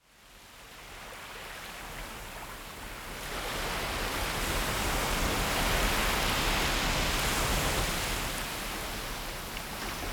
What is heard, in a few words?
Ocean Sound Effects Ocean Sounds Nature Sounds Wave Sounds